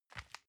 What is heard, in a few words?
envelope grab soft